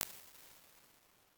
19x24 ft Studio Instrument, impulse responses

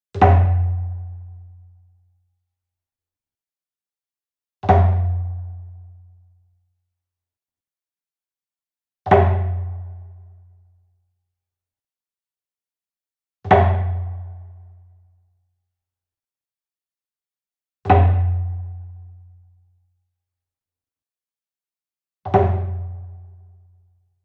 Wood Percussion Big Bom Flam Medium; boom library; Cinematic Strikes Construction Kit

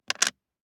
DICE on Plastic, Drop, Standard, 6 Six Dice; Samples; ArticulatedSounds; DICE Sfx Party (Extended)

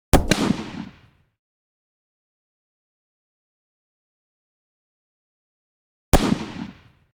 .223 Rifle Blasts Close; Hollywood Edge; Heavily Armed

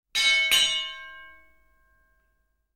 Boxing Bell; KMP Film Video Sound Effects

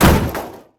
hood impact; Blind Drive

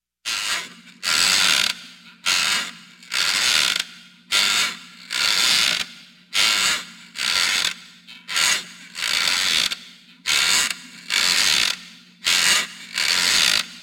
Creaky Rocking Chair; Halloween Havoc - Sound Effects; Ktel

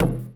Street Ball Wood Back; SFX Kit; Street Basketball

